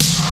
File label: snare; osu